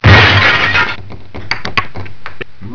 die

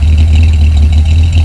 f lift idle

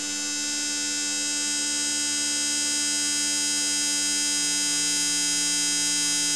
robotic

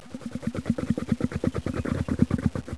zombaron idle

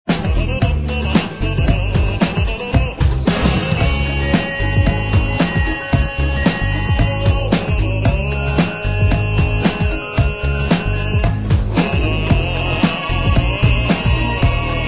Suspense

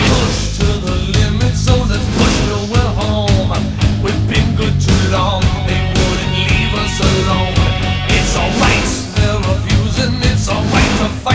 music wake up

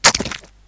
place foot

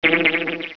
attack, headcrab

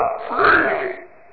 zombie alert

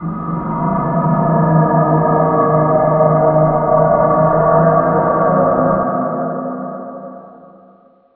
scare

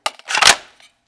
m16 mag in

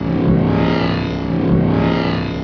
scary amb

